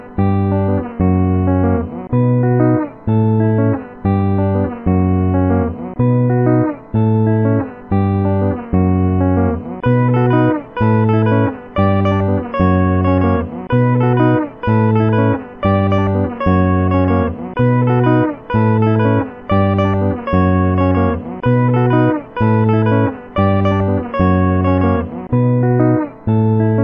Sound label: dub; troll song